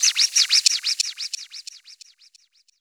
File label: FX, Hip-Hop & R&B; USB Soundscan vol.47; AKAI